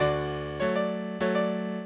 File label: minuet, mozart